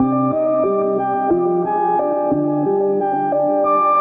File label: wonky, electronic loops